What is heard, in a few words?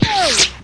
chaingun projectile, weapons; Tribes2